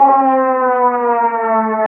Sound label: exciting loop drop sound